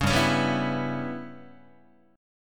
G 6th Flat 5th G, Guitar; G6b5 strummed; JGuitar

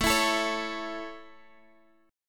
A Major, Guitar DADGAD

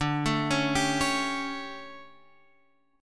D Major 7th Suspended 4th, Guitar, Open F; DM7sus4 arpeggiated

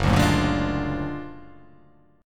F sharp Augmented Major 9th F sharp

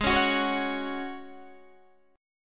G sharp 7th Suspended 4th G sharp, Guitar DADGAD

